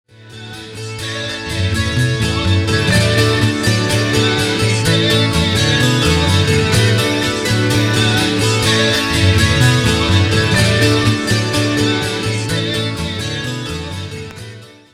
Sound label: loop sample